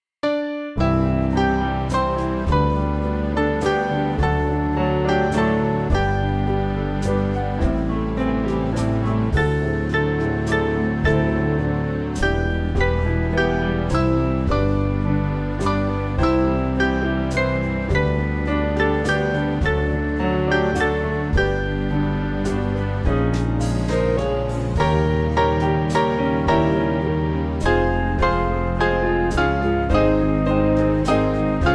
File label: Whiter Than Snow, refrain, Band